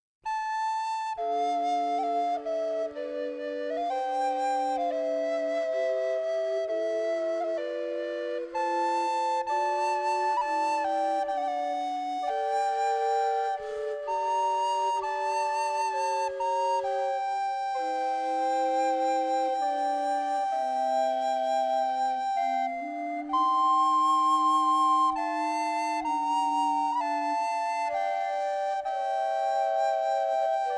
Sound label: Flutes Trio; The Three Winds